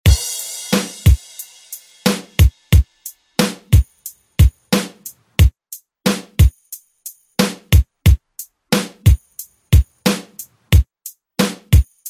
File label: 90s; ReDrum